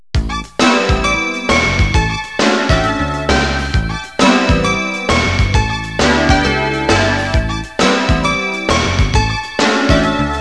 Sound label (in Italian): musica elettronica; electronic